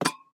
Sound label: Peg Leg Footstep; Pitch Black - A Detective's Demise